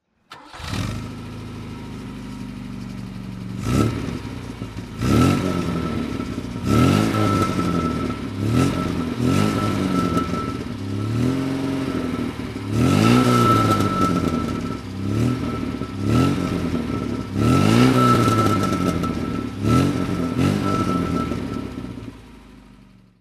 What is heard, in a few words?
mg mgb throttle; MG MGB roadster, 1972; Engine sound; V3D/ ClassicarImages